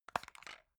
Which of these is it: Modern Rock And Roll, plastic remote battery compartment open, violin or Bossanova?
plastic remote battery compartment open